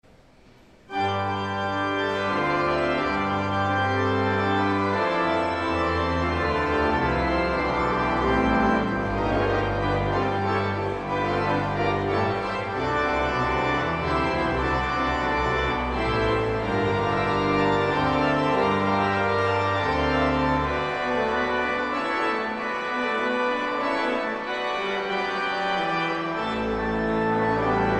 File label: Organ; Flor Peters; Entrata Festiva